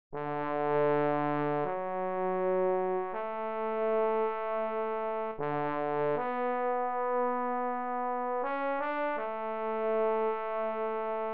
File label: Trombone Euphonium Bassoon